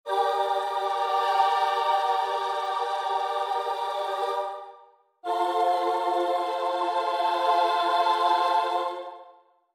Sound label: motion choir fx